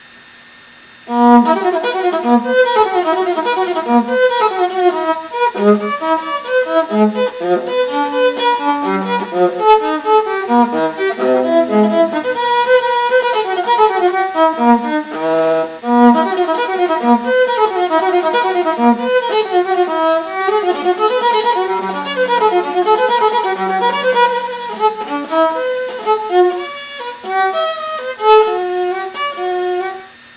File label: fast violin playing; lego